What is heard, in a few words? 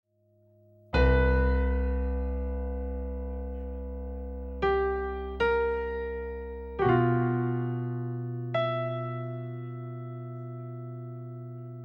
Solo Piano; soundtracks for the films of cathy lee crane